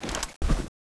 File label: draw, weapons; bloodhunter; cstrike